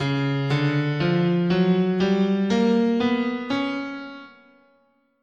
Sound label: C sharp Oriental, guitar, scale